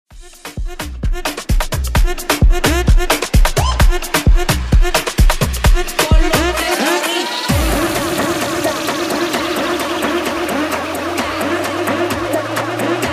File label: Afro Bootleg